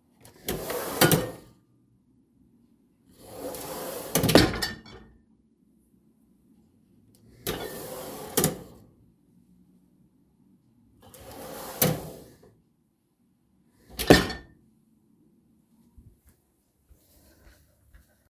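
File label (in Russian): pull-out kitchen section with jars and bottles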